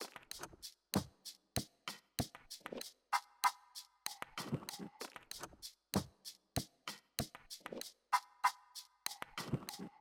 TOPS, viral hiphop

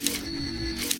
zoom

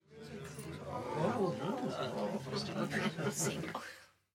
crowd Chatter brothel; spellbound

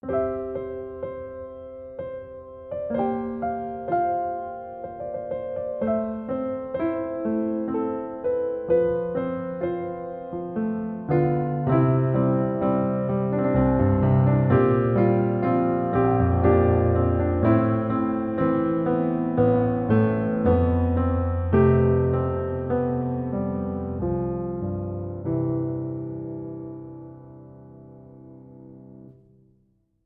Keyscape

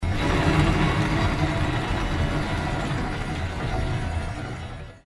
casino

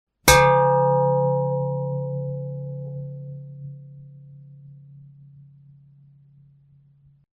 Metal Hit

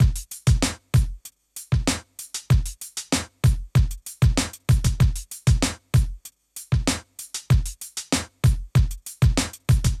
DRUMS, viral hiphop

